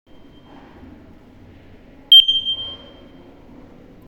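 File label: low voltage sound, smoke detector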